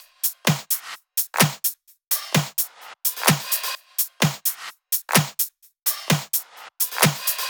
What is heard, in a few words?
128BPM Kit; Vengeance Samples; VFH1 128BPM Diesel Kit